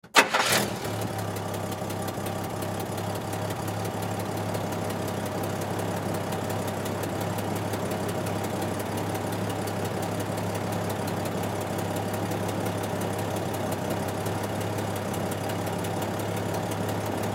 Truck Chevy Half Ton Stepside Pickup Start Up Idle Shut Off; 1973; Grill